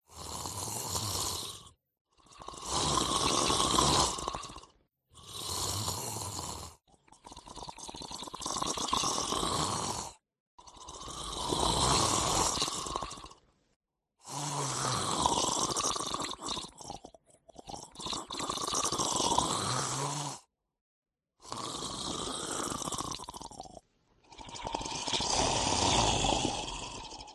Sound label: hag sounds